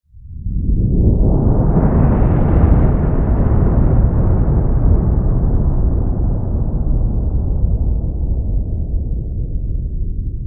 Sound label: Sfx Critical; Cobalt